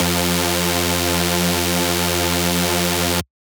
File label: Lead Shot F Dark, Dubstep; One Shots; Ghosthack; Dubstep Sound Arsenal